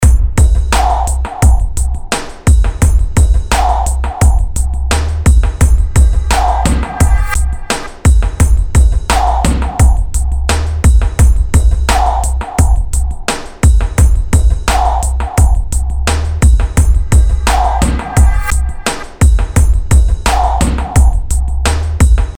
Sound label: Punch Film TV